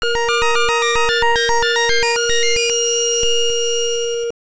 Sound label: Departure Bell; Densha de Go; GBC